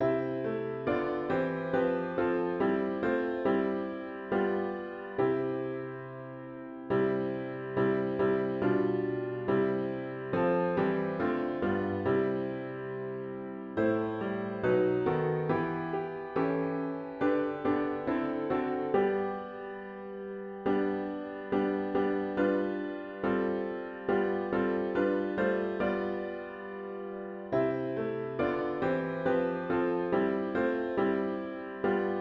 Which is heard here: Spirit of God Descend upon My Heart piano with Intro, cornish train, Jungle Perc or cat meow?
Spirit of God Descend upon My Heart piano with Intro